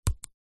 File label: sat nav touch screen finger single tap on screen